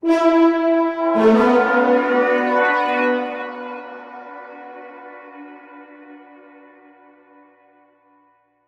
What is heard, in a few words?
Music Stings; Hangar